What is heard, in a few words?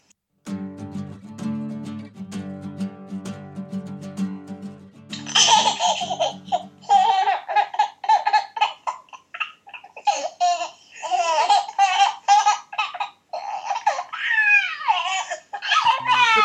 giggles